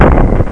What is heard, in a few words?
explode chest; Citadel of Dead